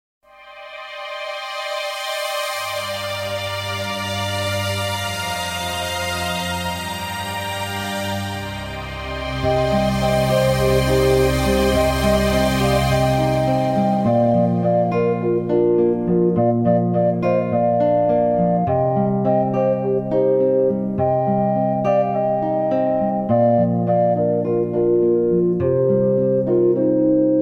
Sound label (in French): instrum., Noël à travers chants